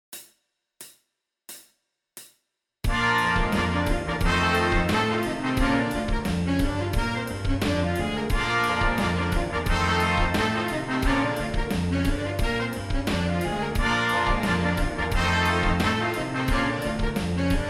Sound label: Interval Exercise